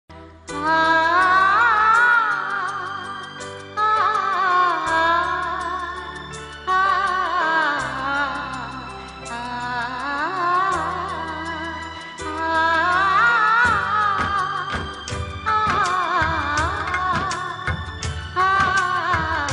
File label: movie background music; mohabbatein; sarukh khan